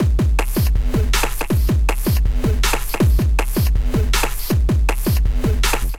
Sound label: Kit 160 bpm, drums; MIDI Clips; Flutter Kit; Ableton; Factory Packs; Skitter and Step